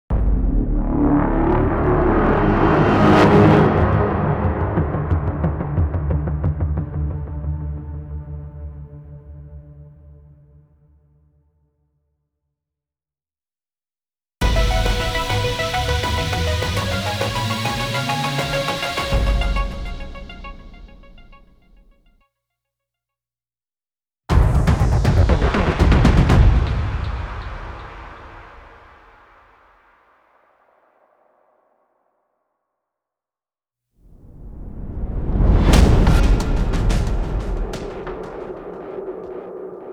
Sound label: Various Jingles; METAL GEAR SOLID V THE PHANTOM PAIN ''METAL GEAR ONLINE''; Metal Gear Survive